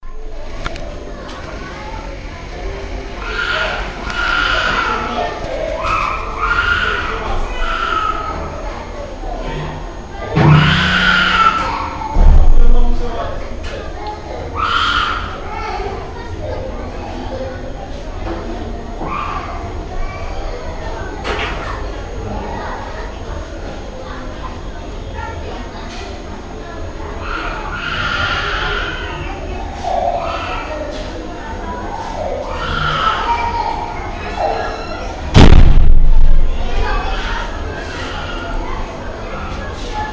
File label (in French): chantier et cris enfant; bruits chantier